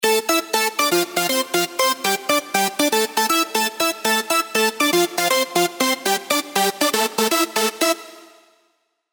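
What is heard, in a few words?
synth, Serum supersaws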